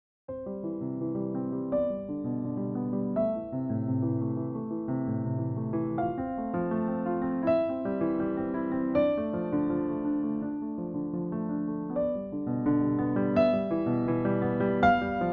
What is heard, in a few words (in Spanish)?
Arpegios amplios